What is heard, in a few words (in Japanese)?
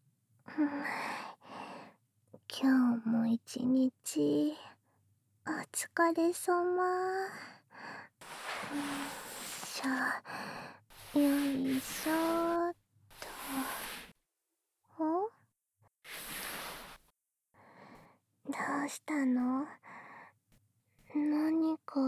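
猫耳舐め+お掃除フェラ（囁きブースト 効果音大きめ射精音なし）, ASMR; お耳舐めて欲しいの～？; ダウナー猫娘; KU100収録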